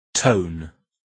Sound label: tone